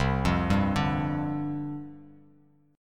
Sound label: C Suspended 2nd Suspended 4th, down; Csus2sus4 arpeggiated